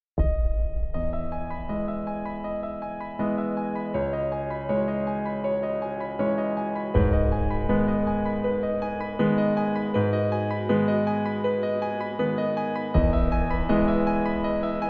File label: orchestra bird view